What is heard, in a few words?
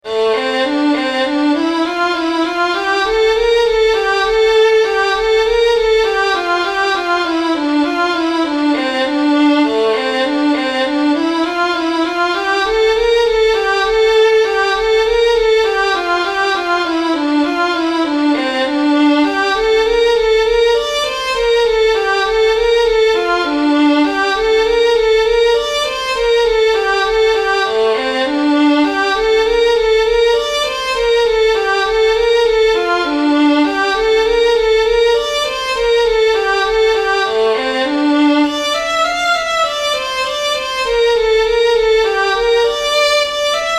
Adventures in D minor